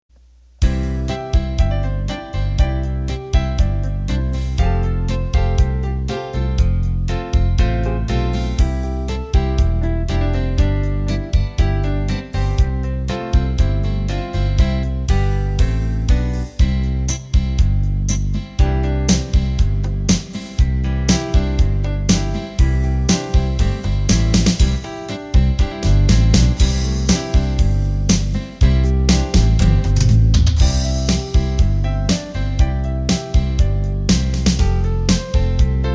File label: Country